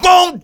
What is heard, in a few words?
Vocal Oneshots; Vengeance Samples; Vocals